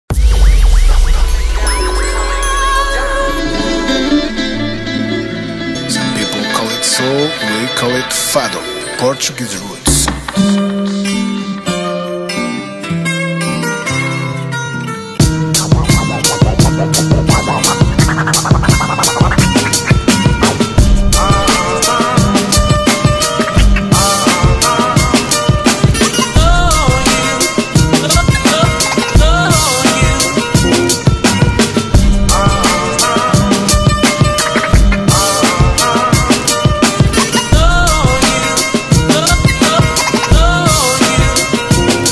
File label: Remix